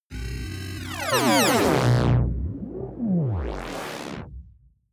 turbine synth, sweeps swells; comedy-cartoon; soundpool

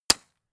gun shot silencer close; schusswaffen

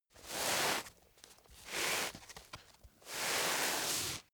door mat wipe shoes; haus garten; wohnen

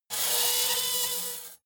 metal screech phaser steady short

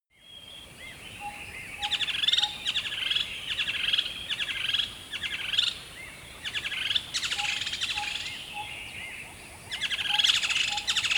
river dawn single bird, dschungel regenwald; atmosphaeren umwelt

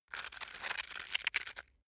stone scrape hard

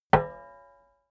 plate hit ring slightly damped short; hits impacts

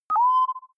click swell filter saw, signale; kommunikation

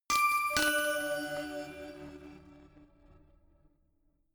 notification glockenspiel crazy third up; instrumente

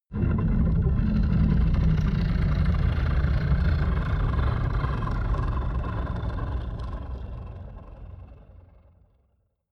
crumble soundscape; horror

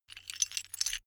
metal pieces clatter short

